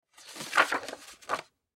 Photograph Set Down, Photograph Set Down Picture; Set Downs; Foley; Blastwave

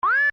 Digital Signal Wah Beep; Digital Clicks, Blips & Alerts

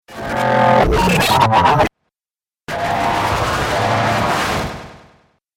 Dubstep Scifi Effects; Science Fiction Sound Effects

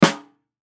Drum Snare; Snare 14 Inch Pacific Single Hit; Drum Kit; Blastwave

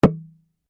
Bongo Low Strike, Small wodden bongo set; Drums; Musical

